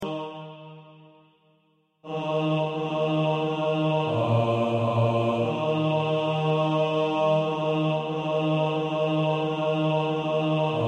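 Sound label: bass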